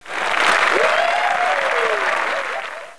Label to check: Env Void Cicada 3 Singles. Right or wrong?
Wrong